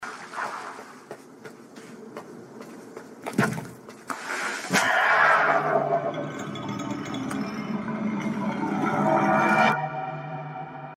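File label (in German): levelübergang; Titan Souls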